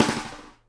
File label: snare GLASS, Live Snares; E-MU Producer Series Vol. 8 – Platinum Phatt (CD 1)